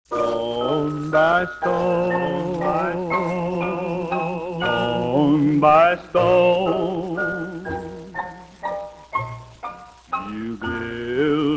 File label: 78 declicker